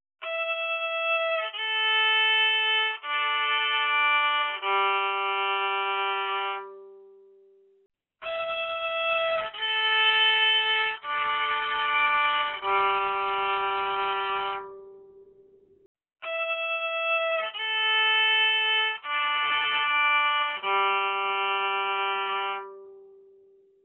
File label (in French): violon MIC GSM